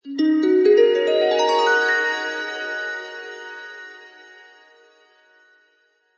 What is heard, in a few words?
sound design harp glissando dreamy washy; zapsplat